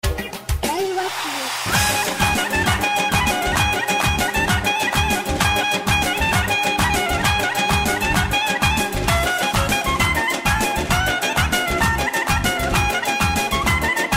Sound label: Kavkaz Dance